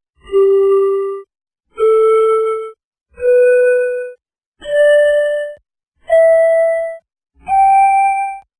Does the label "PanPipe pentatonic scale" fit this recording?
Yes